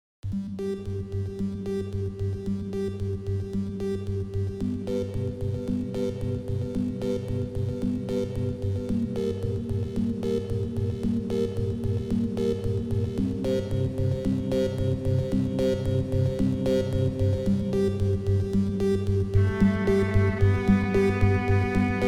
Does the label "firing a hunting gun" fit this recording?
No